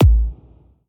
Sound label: Trance Kick